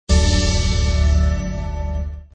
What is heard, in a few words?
Bag Stinger; Mirrors Edge